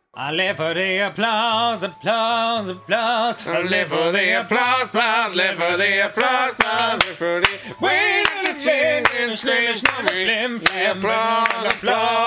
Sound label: Applause; Game Grumps